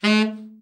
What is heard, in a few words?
TENOR, Sax; Giga Samples Collection